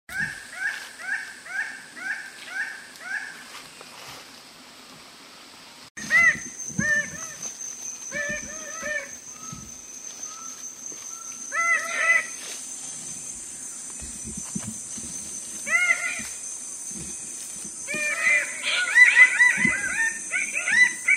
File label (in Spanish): ave del paraiso roja sonidos paradisaea rubra